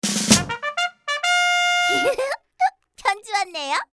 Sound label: A bugler, notifications; Samsung Galaxy S Hoppin